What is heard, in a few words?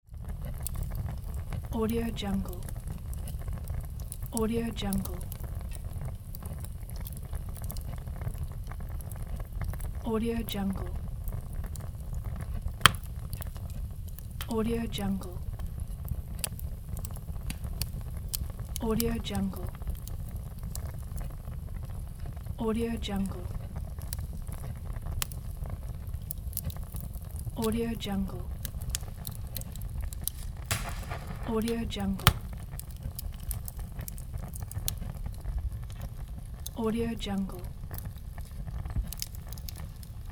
campfire